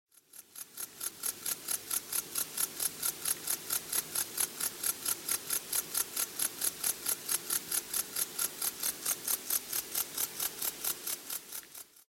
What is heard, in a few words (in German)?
Kurzfluegelige Beissschrecke; Heuschrecken; insectes; animaux insectes